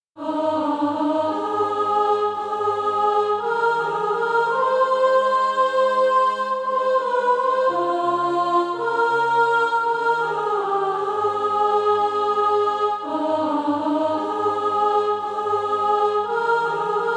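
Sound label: sop, Practise Music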